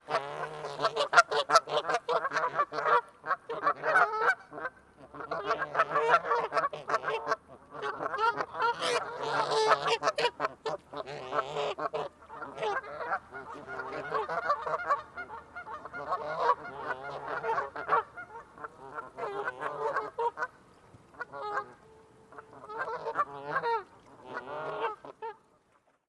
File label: birdfowl excited gaggle vocals call response close geese; fvtt sonniss gdc audio